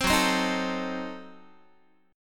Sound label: Gb Diminished 7th, Guitar; strummed; JGuitar